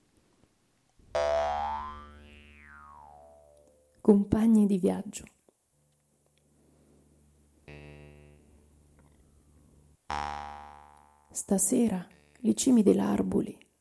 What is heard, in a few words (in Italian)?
Compagni di viaggio Dialetto